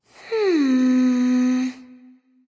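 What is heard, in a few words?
thinking, nonverbal; smite; voicepack; khepri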